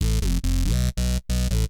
TECHNO BASS 140 A

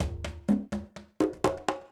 PERCUSSN ETHNO 125, Drum

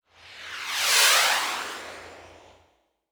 SPACE SHIPS; SpaceSounds

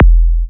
Minimal Kick, Drums, Kicks

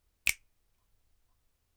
Snares & Claps, One Shots

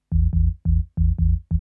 TECHNO BASS 140 A

